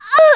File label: Die